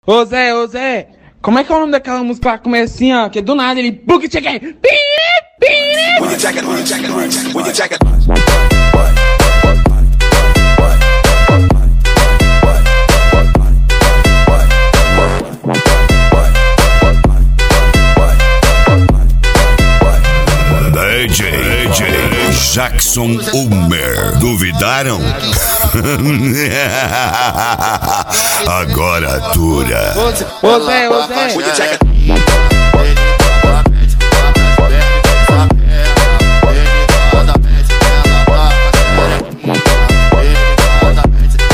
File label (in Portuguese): eletro funk; balada g4